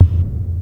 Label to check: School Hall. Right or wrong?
Wrong